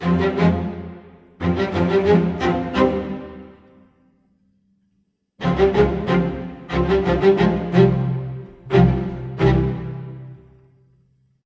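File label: 90 F minor, Low Strings, Orchestra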